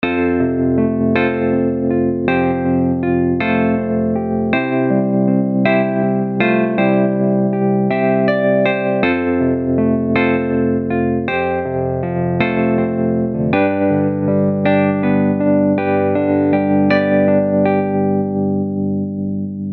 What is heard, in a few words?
Pianet Default